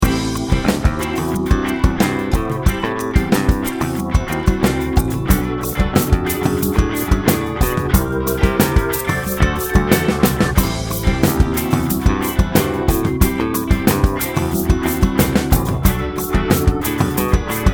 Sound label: Samba Funk Rock; production music; Afro Cuban Brazilian